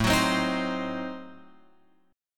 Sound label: A Diminished 7th, Guitar Open F; Adim7 strummed; JGuitar